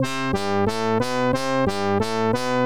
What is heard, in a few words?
Fanfare; Arch Rivals; Genesis